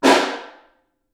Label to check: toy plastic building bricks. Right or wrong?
Wrong